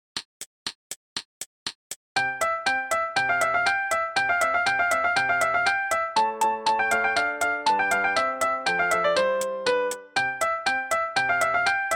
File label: rondo full with solo